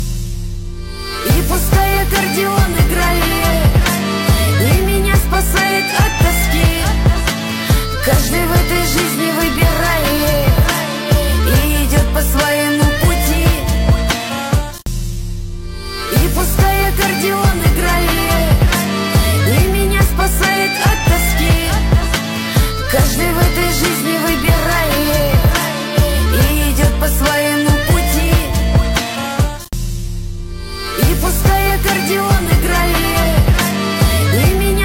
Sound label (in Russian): akkordeon